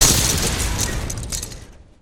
vehicle death; aronwk collection; ndaudio

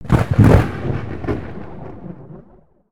Wing Lightning, ambience; Ninjago

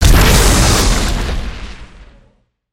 property explosion

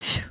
bored blow, emotes

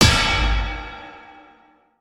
Anvil Item

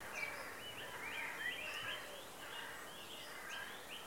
Birds Loop; ninjago

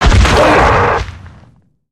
Dragon Fall

